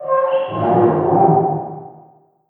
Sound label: Random Scrape